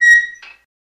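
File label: Squeak Metal Gate Small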